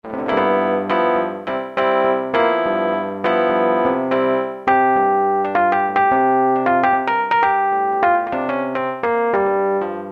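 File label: Hard Electric Piano, Roland U-110; Factory Patch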